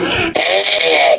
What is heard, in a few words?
zombine pain; npc